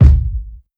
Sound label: verby kick drum one shot A key; kick drum samples